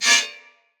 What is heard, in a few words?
Melee Impact Metal